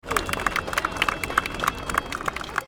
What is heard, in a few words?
AUDIENCE Claps and Cheers stereo; Infernal Radiation